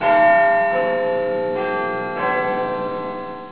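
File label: wind chimes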